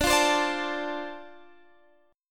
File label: F 6th D, chord